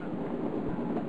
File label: Diesel; U-Boat 1.5.3